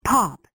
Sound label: POP